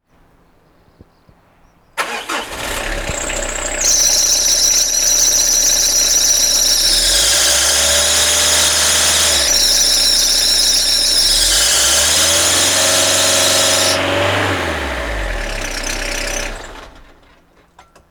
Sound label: drive belt slip